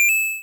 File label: confirm; breakout